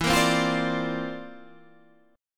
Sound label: C 11th E